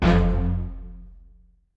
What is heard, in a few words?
Stabz